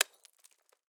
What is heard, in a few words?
ice hit; teardown ps5 windows xbox series xs gamerip 2022